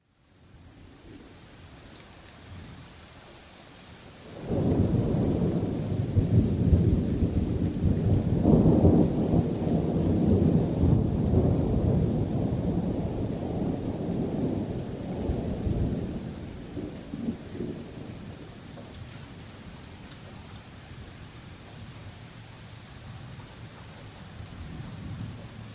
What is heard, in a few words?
Thunderstorm